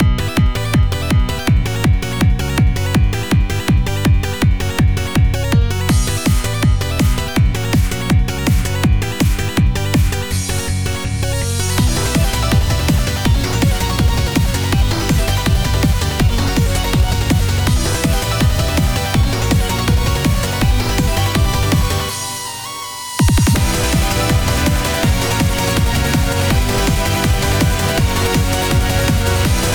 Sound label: Guitar Solo Only; Friday Night Funkin' Undertoad Goomba on Parade